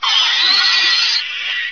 raptor leap start middle end

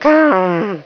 attack; svencoop

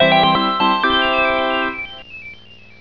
checkpoint; svencoop; sonic

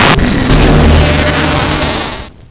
alien teleport; svencoop; debris

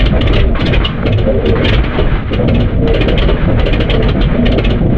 helico endo; svencoop; sa13

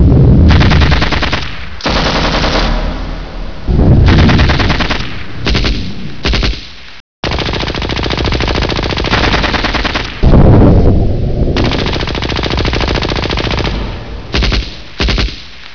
distant battle; svencoop; amckern

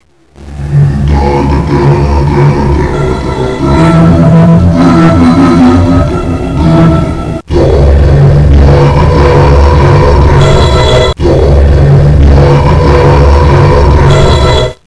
New Age; svencoop

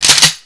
cock; svencoop

